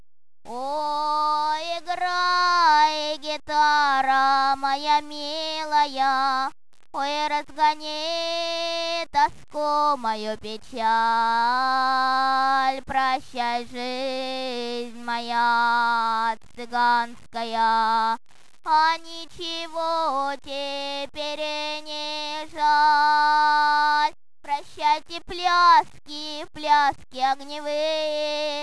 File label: Gitar